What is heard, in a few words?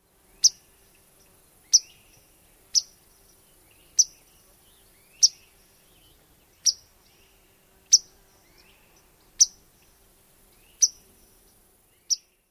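chips, Swamp Sparrow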